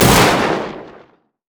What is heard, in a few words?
reckless swing; olaf